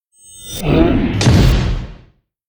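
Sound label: entiwood attack; monster; Senillinea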